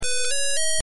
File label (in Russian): musik